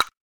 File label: Quest Timer Tick; Quests